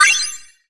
Fishing Get Normal; MiniGames

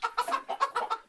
AMB Battle Village

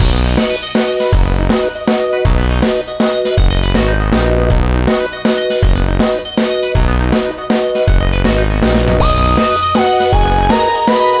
Large Hall; Syssd Ev02 Large Hall

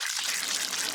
Zombie Fungus A Gushing

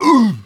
Male Hurt; Mars Sortie

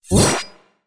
Shield

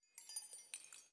Desert Rain; Rabbids Multiverse

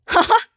Fight Laugh; Hollow Knight; Hornet

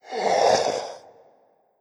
Scream; Legend of Keepers; Sarco Horus Eye Scream

